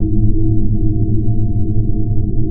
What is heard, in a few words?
building hum; ambience; Paradox